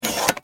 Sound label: pop up; Packet Tracer 5.1